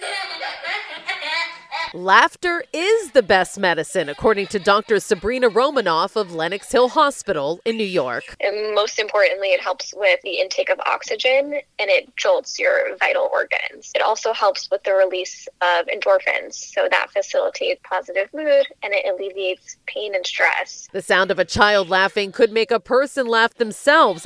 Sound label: Laughter; CBS News NY